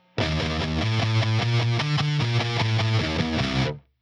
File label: vh4